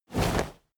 whoosh soft; swooshes-swishes

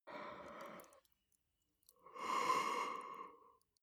male yawn quietly; koerpergeraeusche; menschen; soundpool

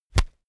toilet paper sheet tear off fast; haus-garten

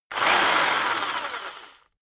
rock drill rev medium medium; maschinen; bohrer

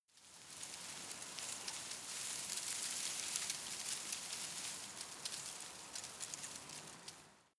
shrub tiny leaves rustle very soft, garten; haus-garten

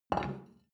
plate place table soft short, gastronomie; soundpool

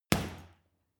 boxing punching bag hit medium; sport-freizeit; boxen

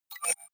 hover fast, signale; soundpool

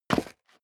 step leather shoes concrete grit single stair up; schritte

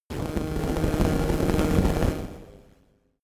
static crackle noise short, sciene fiction

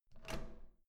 door handle up

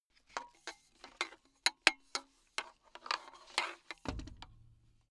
metal pipe handling low

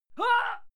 female struggle; koerpergeraeusche; menschen; soundpool

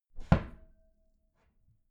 wooden door close regular glass clang

